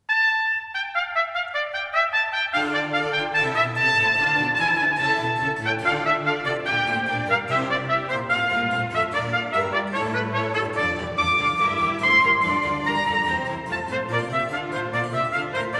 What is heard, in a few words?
Recorder Sonata in D minor, Alla breve; Music for Trumpet